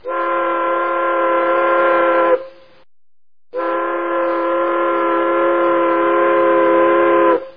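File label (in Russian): двойной длинный гудок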